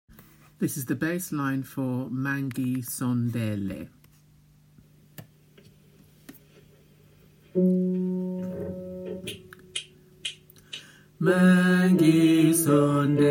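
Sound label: BASSES, gospel choir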